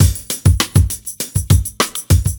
Drum loops Boombap

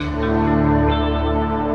guitar mild

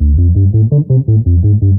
bassist; Loops; Electronic

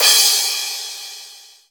sneeze, Cymbals

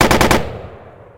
machine gun; Crash Bang Boom

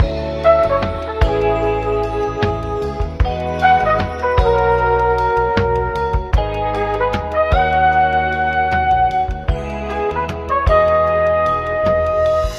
full horns, drum loops, funky

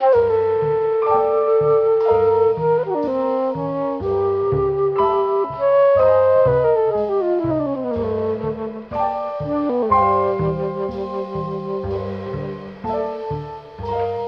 flute and piano, Loops

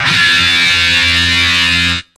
screechy, Guitar; Notes

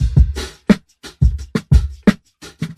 muted, Boombap drum loops

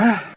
"huh"

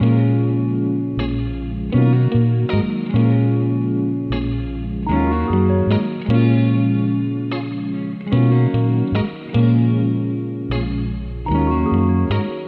guitar quiet; Loops

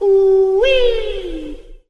"ooh wee", cartoon

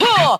"huh"; Voice; ooh ahh

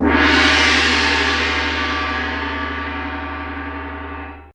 tam tam

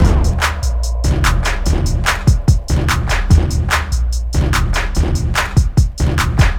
Drum loops, Electro

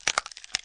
bone break

